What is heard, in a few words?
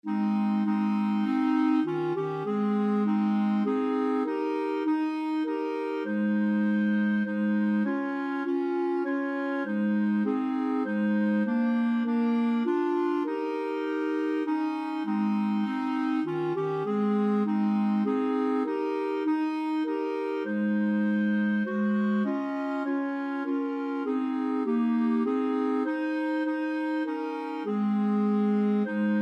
god glory clarinet, clarinet trio